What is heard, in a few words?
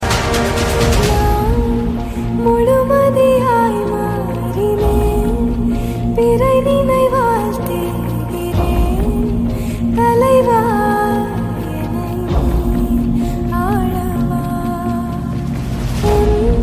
BGM Ringtone; KGF